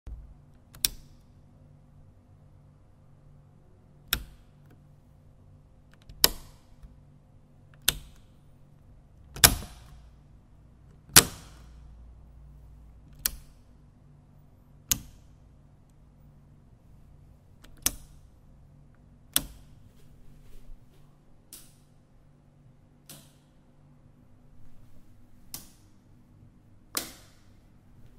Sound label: turn on or off lamp sound